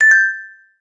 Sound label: success